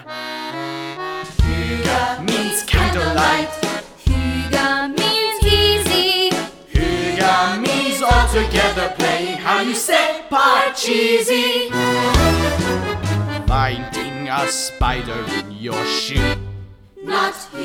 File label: Hygge Vocals